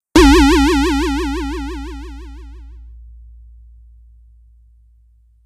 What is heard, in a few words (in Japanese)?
ぽよん音